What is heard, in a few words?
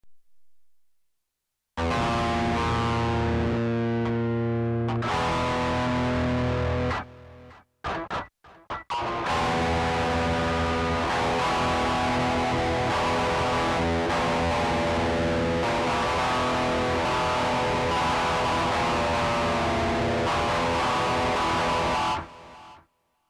a little messed up, guitar